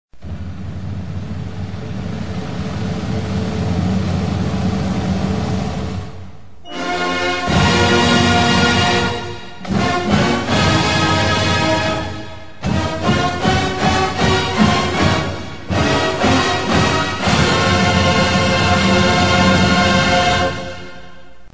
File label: Fanfare; Northwestern State